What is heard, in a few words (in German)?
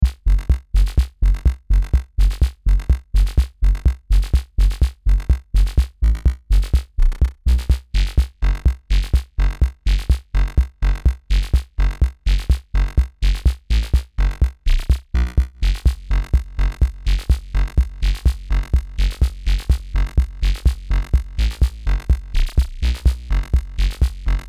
Mix Bus ohne Sidechain